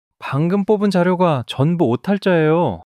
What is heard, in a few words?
surprise